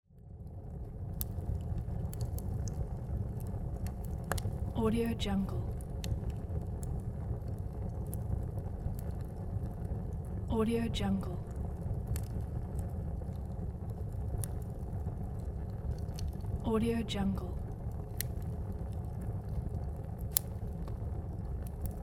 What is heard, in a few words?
burning